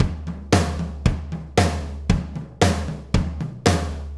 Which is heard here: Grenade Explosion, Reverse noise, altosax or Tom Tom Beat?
Tom Tom Beat